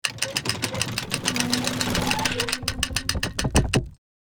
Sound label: Catapult charging medieval crackling